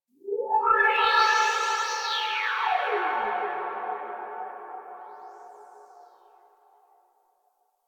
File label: Rift One Off; Warframe; Magician